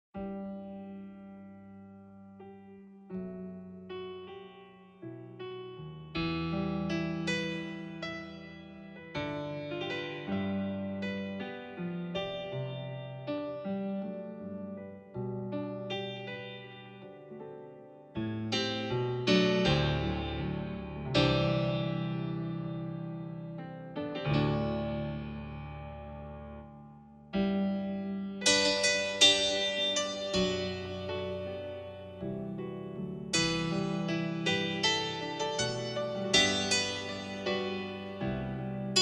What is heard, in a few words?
Rain